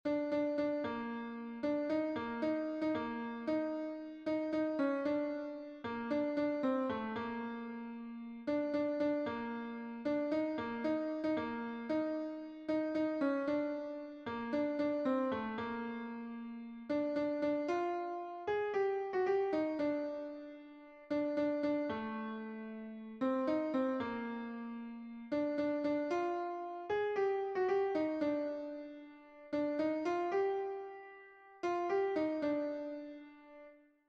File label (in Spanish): contralto, himnos en piano; cantos del camino